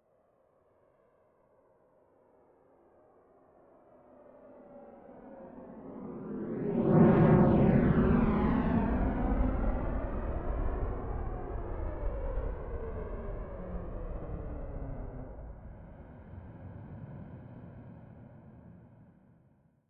military jet fa-18 hornet turbine flyby left to right fast distant